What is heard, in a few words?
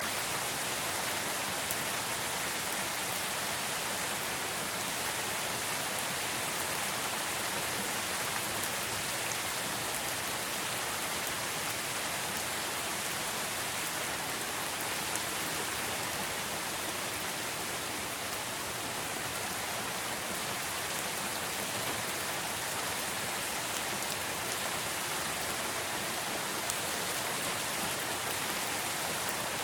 rain medium; unity3d